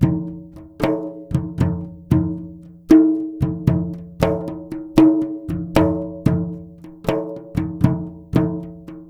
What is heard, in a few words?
MLY DRMS; Spectrasonics - Heart of Asia CD2